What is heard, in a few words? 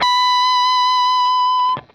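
fuzz guitar vibrato; MAYFUZZ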